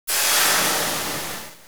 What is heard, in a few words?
Halapeno Hiss